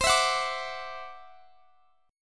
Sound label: E 7th Suspended 2nd Sharp 5th C, guitar